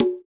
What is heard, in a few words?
Conga; Conga-Bongo; Equipped Music; Loungin House